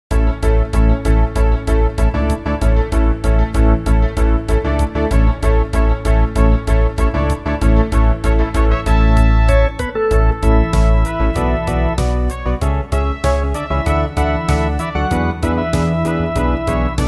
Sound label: meditation